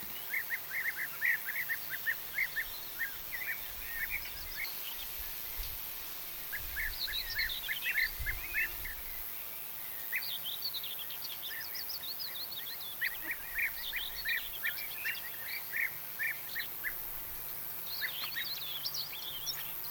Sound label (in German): bienenfresser